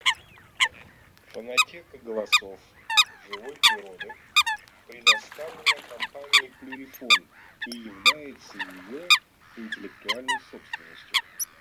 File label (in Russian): Лысуха Folaga; plurifon